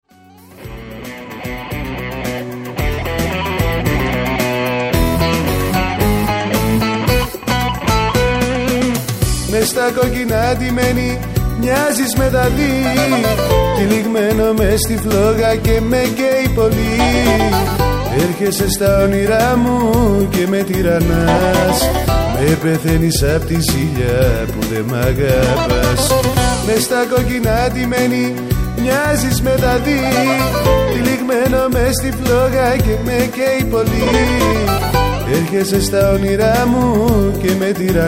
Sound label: Bouzouki